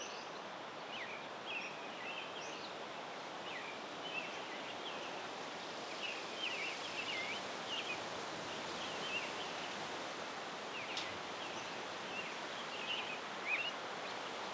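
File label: exterior, ambience; Counter Strike Model Pack; CS:GO; inferno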